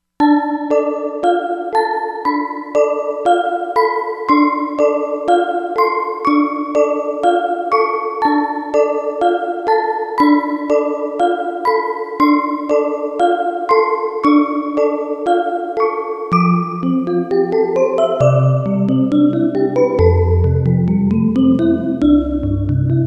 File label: Toy Piano, Korg MSC-07S Synth